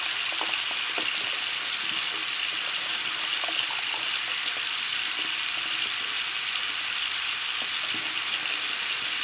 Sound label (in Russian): beskonechno lyushayasya voda v vannoj